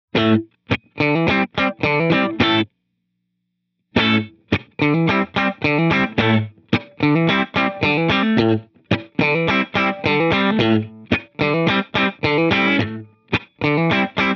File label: Gated Reverb Strat